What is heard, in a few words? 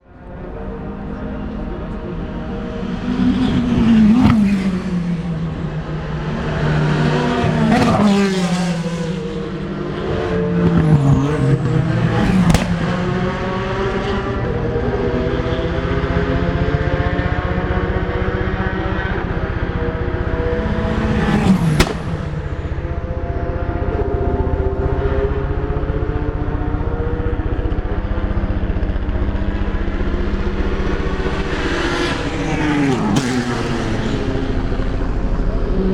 porsche and v10 before tertre rouge sunday